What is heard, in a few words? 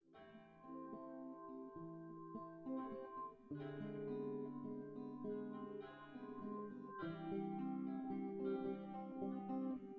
no drums synth conv 1m sim; SASSEC07